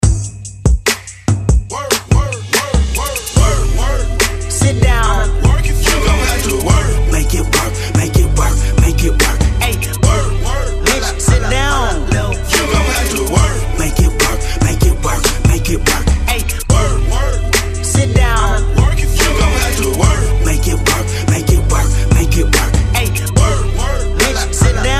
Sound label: redrum breaker hype 72 bpm